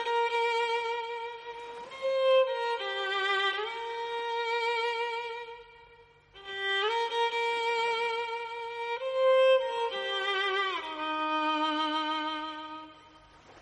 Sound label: violin Ringtone; Onepiece; Brook